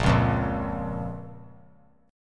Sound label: Bb Minor; strummed; JGuitar